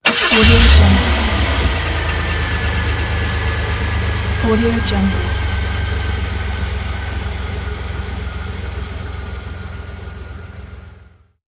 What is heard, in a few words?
car starting; liquid-sound